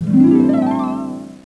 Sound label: Harp Gliss